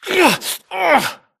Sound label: grunt Struggle; Titanfall 2